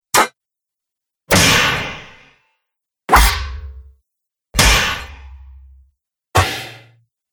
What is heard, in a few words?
Sword And Weapon Hits; 5 Sword And Weapon Hits - Pack 5